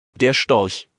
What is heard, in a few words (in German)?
Storch; wildvogel